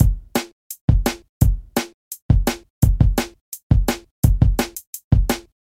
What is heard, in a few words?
Jungle Run Loop